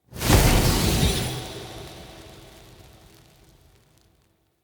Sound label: Fire Mote Pickup Trail Move Burst; Motes